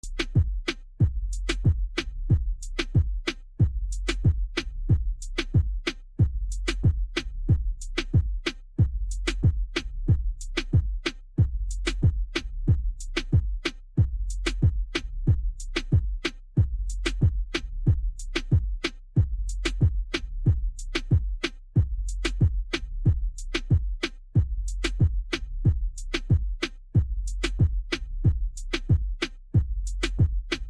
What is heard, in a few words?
REGGAETON SHOW MIXING LIVE